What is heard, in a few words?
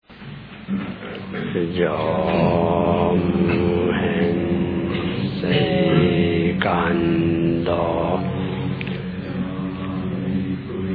end chant